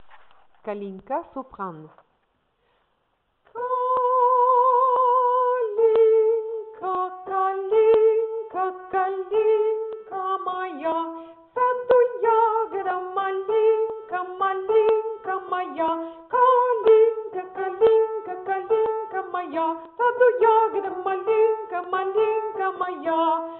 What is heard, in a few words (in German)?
sopran; kalinka